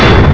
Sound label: rank up